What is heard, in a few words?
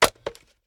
sn reload empty mag hit; gmod; iw8; alpha50